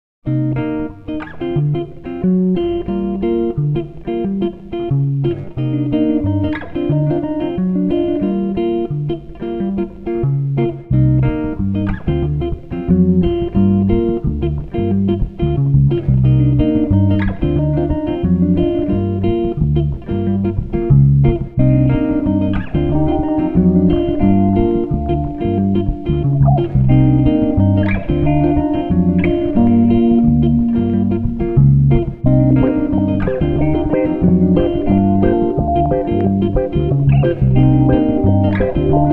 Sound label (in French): Boucle; lanfeust